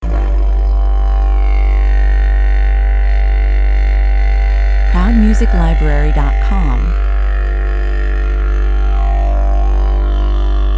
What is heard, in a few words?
aboriginal 138 bpm